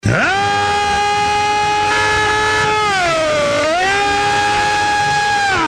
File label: uuuuuaaaaaarrrrrrruuuuaaaaaaggghhhh, screaming; alex jones